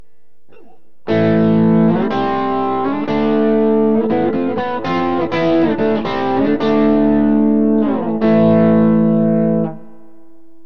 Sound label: darkburst bridge; burny; fugly clips